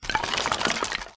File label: skeleton hit; trespasser v2